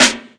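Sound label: classic hip hop Snare; Classic Hip Hop Mega Kit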